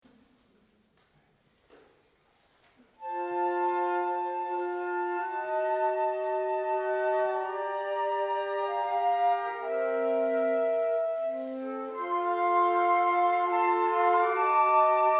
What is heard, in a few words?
Lullaby